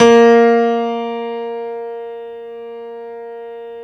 Stereo Grand, Keyboards

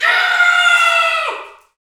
Bullhorn, Afro Horns, Africa

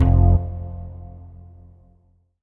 HOUSE, House Dance

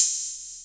JD800 Dance Card Drums

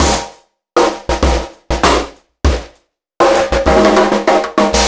Beat, Processed Drumkits; Event Horizon

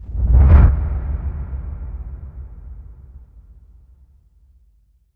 impact flame, Cinematic Impact

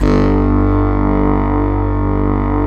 sax appeal G1; Synth

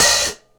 Vintage Bit Hit Hi-Hat; KJ Sawka; Drum n Bass & Beyond; Drum Tool Box

